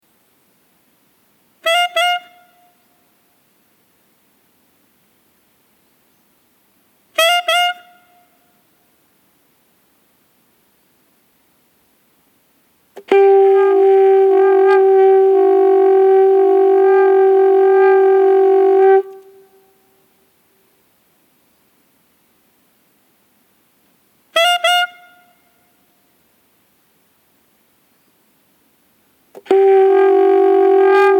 Fernworthy Forest trees

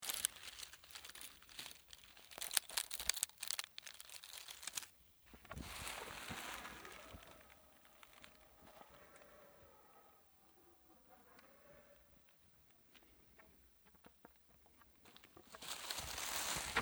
forest walk

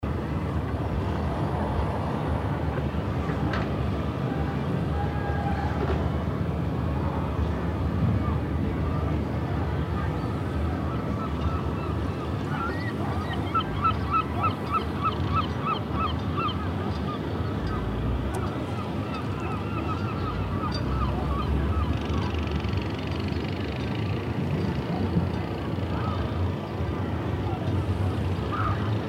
witby harbour; witby harbour atmos; field recordings

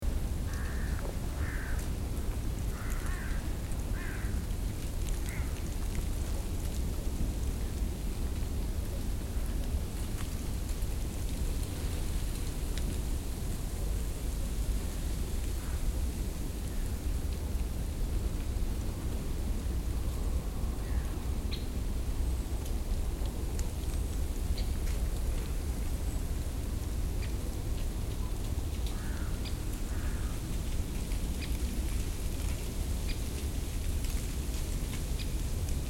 wind ice sparks birds atmos; winter atmos of wind ice and birds; field recordings

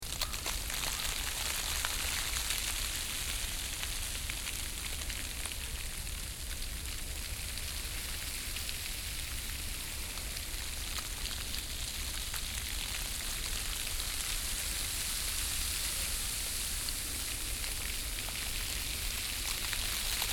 ice falling; ice falling from trees; field recordings

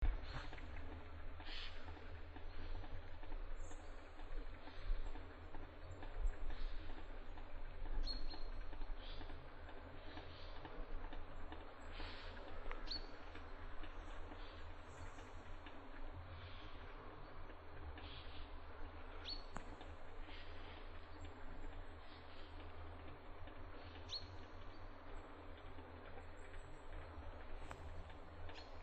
Electric Lines fell trees; field recordings

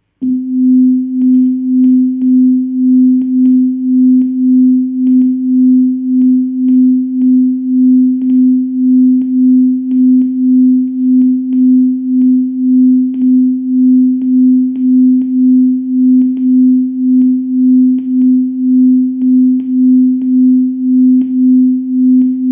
noise upstairs

